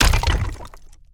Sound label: Crack; MiSide